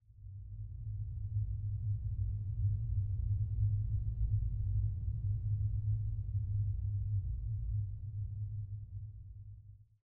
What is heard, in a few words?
cave hit; matmos hl; atmosphere